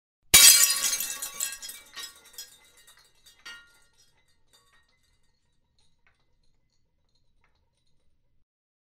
mug break

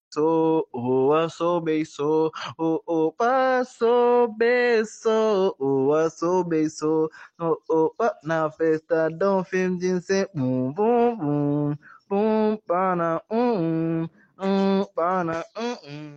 boy singing

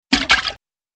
plop in the toilet

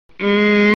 hummmm; morshu

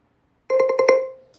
ios critical alert

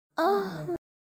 ahh uhh

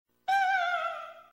death sound effect; pikmin

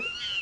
chimp

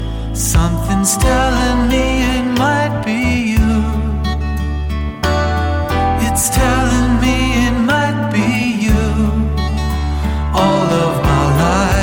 chorus

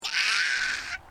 screech

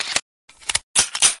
reload; pixel gun 3d; casanova

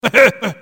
uheheh

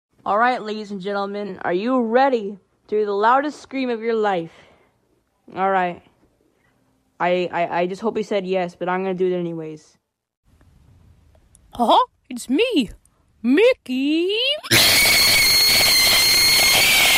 loudest scream of ur life

